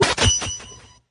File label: sword